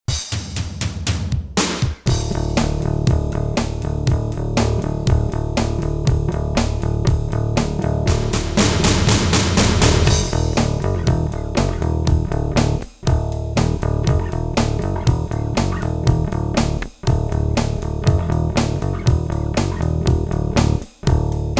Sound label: backing